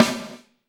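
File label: snare drum, Drums; Bob Clearmountain Drums I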